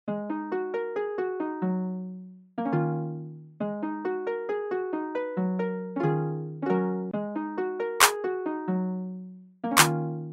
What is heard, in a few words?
Harp